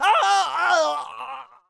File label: Artefact Death; Tony; TR3; Playable Tutorial Level